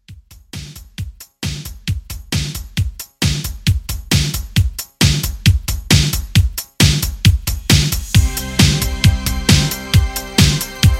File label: 80s Rock Re Drum 134 CLEAN